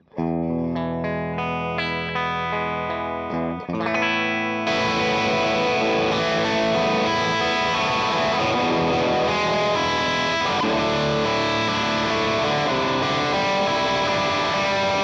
Med Gain

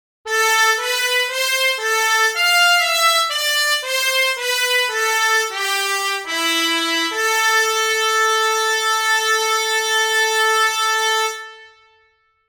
Trumpets

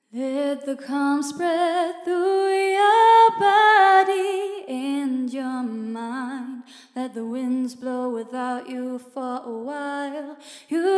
Vocals female

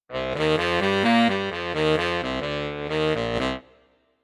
Yamaha YDS-150 Dual Bariton Tenor

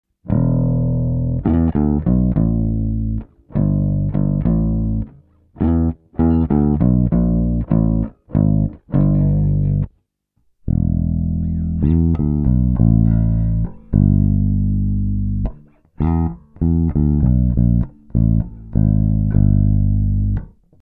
bass

